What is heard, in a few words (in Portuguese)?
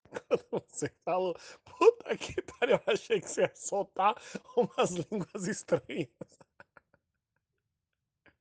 risada